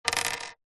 industrial washer stainless steel metal set down wood floor